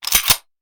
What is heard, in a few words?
Hand Gun Foley; Sound Ideas; Guns